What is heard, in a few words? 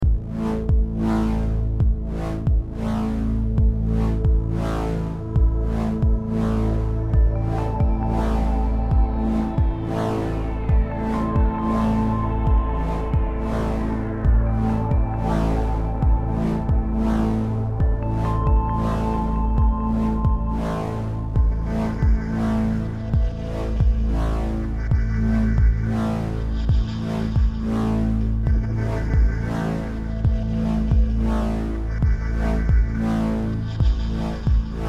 Menu